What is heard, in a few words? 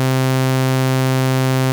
supersaw